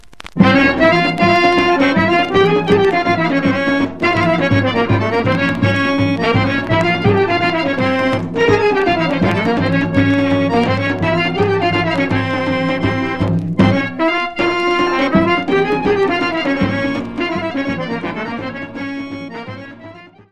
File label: Horo; Bulgarie